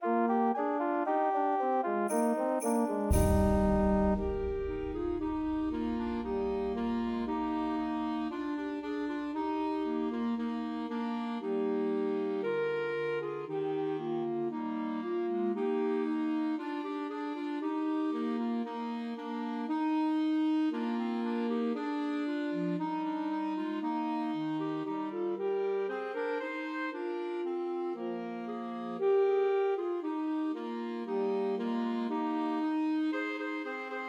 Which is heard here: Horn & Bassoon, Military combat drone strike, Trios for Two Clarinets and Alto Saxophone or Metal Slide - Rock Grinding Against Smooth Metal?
Trios for Two Clarinets and Alto Saxophone